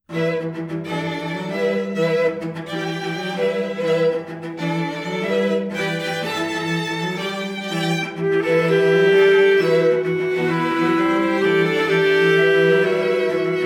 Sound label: bass clarinet and string quartet